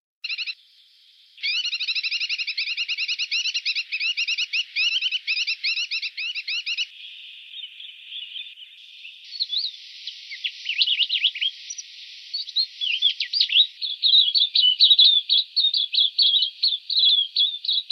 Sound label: silvereye, bird calls